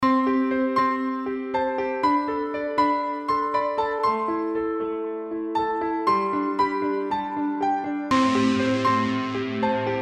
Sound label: Piano